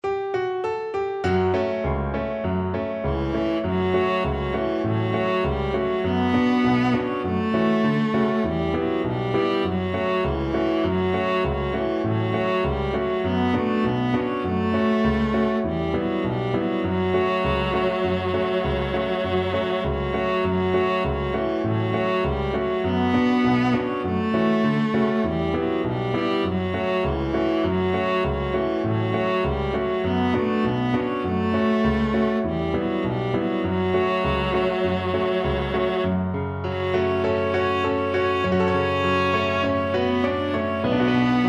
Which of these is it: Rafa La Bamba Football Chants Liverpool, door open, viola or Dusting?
viola